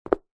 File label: foley footstep single football boot on concrete